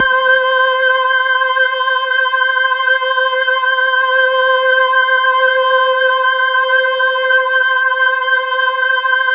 C5, Synth Line; 90 s Sample CDs; NiceBeats; SynthLine VOL-27

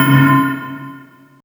POLYFUSION, ODD BELLS; Spectrasonic Distorted Reality 1

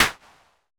rim, Roland MC-909

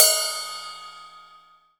RIDE, MONO RIDE CYMBAL

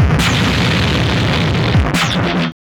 WAR FX

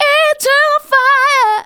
vox, Techno Trance; Ueberschall

